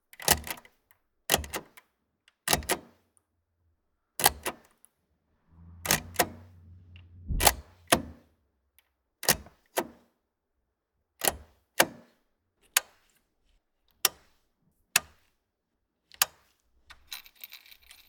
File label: Classic Car Ford Mustang Dashboard